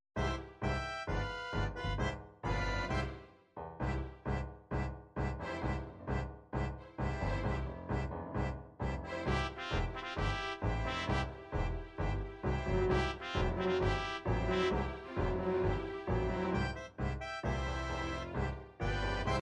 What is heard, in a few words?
tango